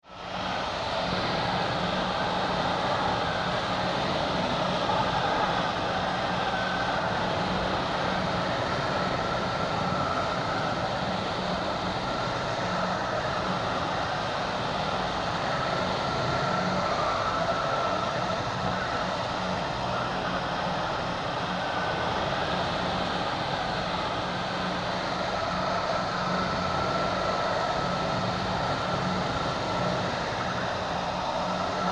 Ambience Ventilation Shaft Industry; Factory Sound Effects; Factories (Sound Effects:Backgrounds); SFX Bible 6

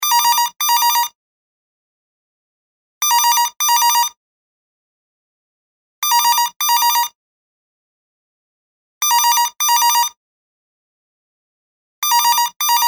Phone ring, Modern digital phone ringing; Telephones; Electronics

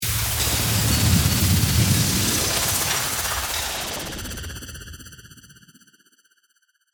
Sweeper, Filter, Flutter, Transition; Production Element, Imaging Element, Accent; Sweeper Sound Effects

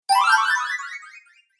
Bright Fantasy Spell, magic; Magic Sound Effects

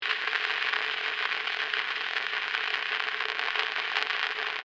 Phonograph Wax, Cylinder, Static, Machine; Technology, Phonograph, Wax, Cylinder, Static, 1896, Edison, Presentation, Machine; Miscellaneous Electronic; BlastWave

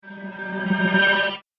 Hollow Metal Future Whoosh; Whoosh Sound Effect

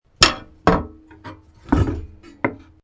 Metal Tool Bang Wood, Metal tool bangs on wood.; Objects

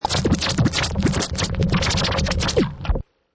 Scratch Fast 100, Vinyl Fast Scratch 100 bpm; Dj Sound Effects

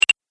Double Click Sound

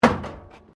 METAL BIN IMPACT PLASTIC CRASH BARRIER

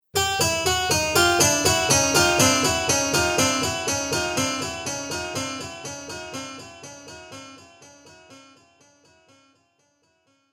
Fail Harpsichord; Musical

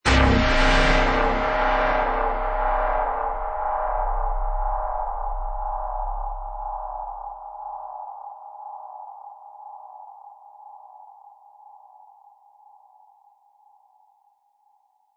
Sci Fi Hit, Dark lingering sci-fi hit; Hit Sound Effects; Sound Design

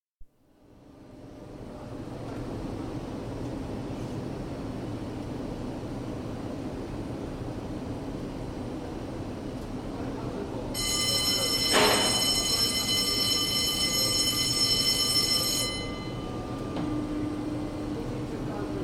Doublewidth press, start, run & stop; Miscellaneous Office; BBC

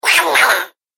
Night Gremlin, Monster; horror

